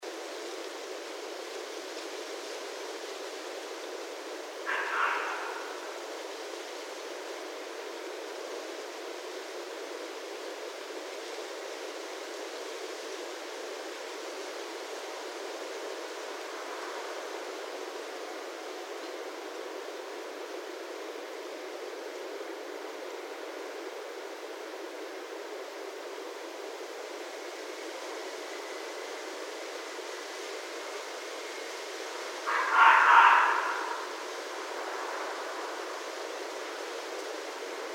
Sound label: Red Fox